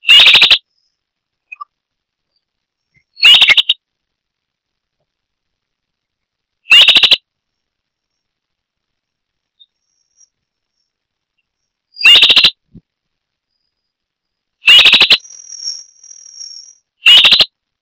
Myiophobus fasciatus - Mosqueta corona amarilla

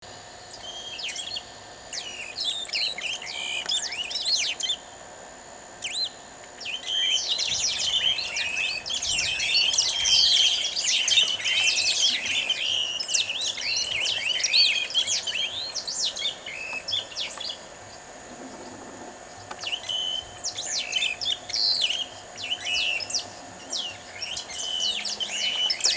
Pseudoleistes guirahuro - Canario de la sierra

